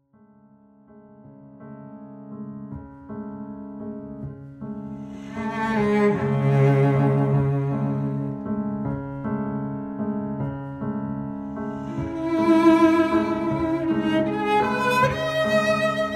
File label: Chorinho